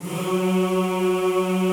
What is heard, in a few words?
F#2, Extended Classical Choir; Best Service